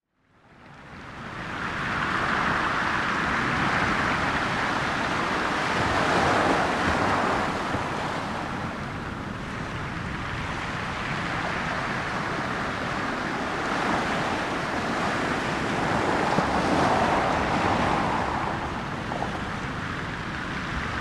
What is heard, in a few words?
Sleep Ocean